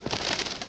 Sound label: sabong; anito; animals